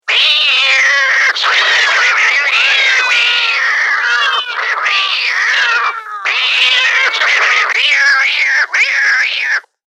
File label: Cats Tomcats Fight; Cat Sound Effects; Animals